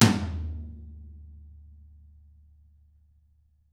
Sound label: DW HIT, Drums; DW KIT2